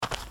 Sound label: claper; Bruitages